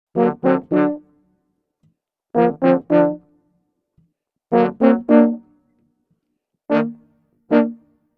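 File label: 110 brasses, g minor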